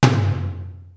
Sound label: JAZZ TOM; BEAT2000